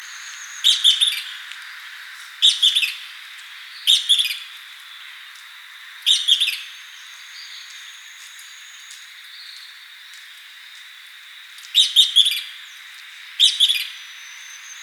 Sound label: Loggerhead Kingbird jamaicensis dawn song; Hoyer